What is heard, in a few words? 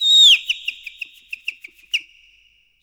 Bird Whistle; Quazibeat; African Secret; SFX